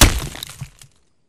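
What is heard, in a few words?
Bullet Light Ballistic Dirt impact; Titanfall 2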